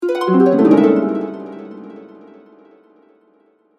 Musical Harp, Production, Element, Musical, Harp, Accent, Mysterious, Chaotic; Musical Sound Design Sound Effects; BlastWave